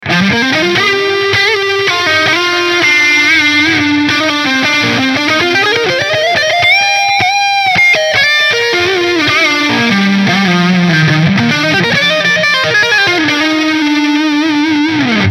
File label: Leadline Front HB Delay